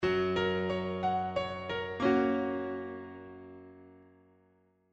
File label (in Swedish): Tonartsgivare F Gb